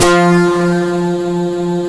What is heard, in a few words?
BODY DIST, BODY BEAT; WORS STATION